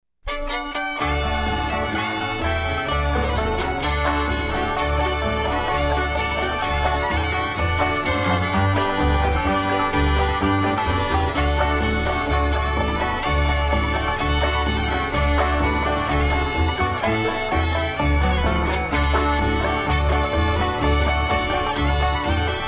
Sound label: instrumental